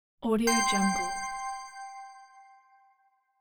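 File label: hopeful bell confirmation with echo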